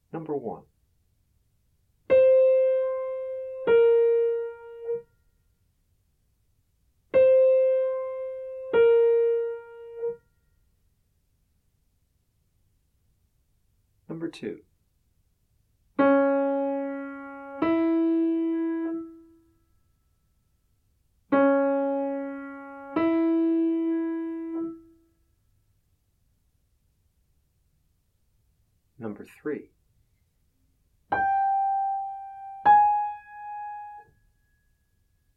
intervals; ear training

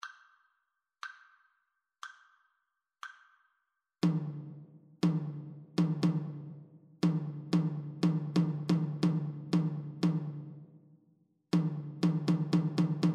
rhythmic dictation

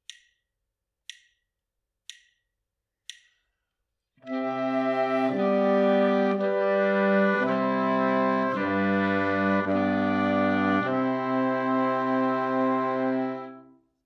harmonic dictation

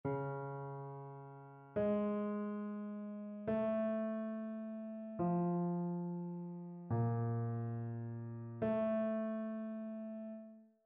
interval chains

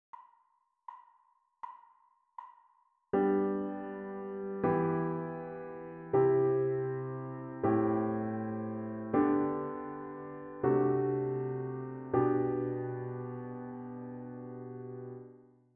harmonic dictation

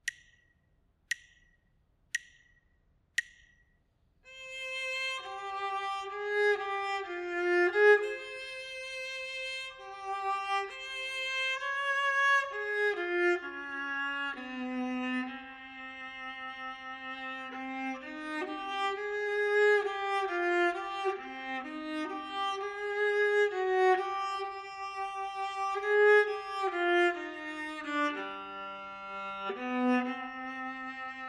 melodic dictation; ear training

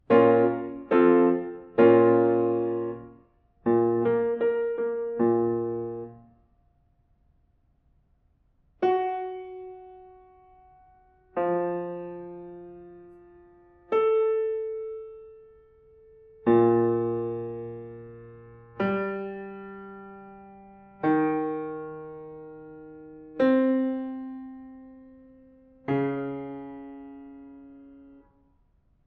diatonic collections

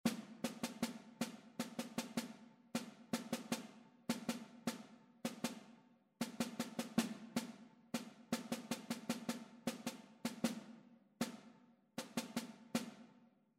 rhythmic error detection; ear training

